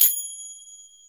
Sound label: Crotale Alert